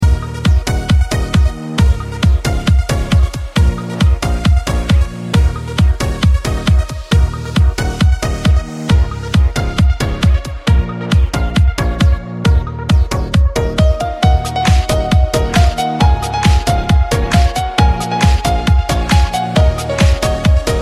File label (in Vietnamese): nhac song remix hay so 1